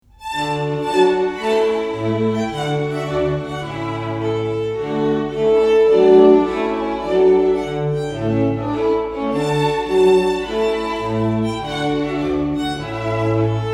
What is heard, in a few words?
Trumpet Tune Quartet; Purcell